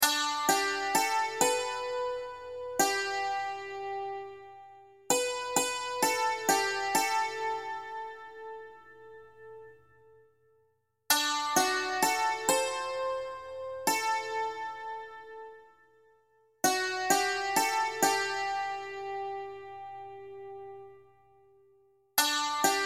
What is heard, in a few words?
melody only, banjo; 5 string; down valley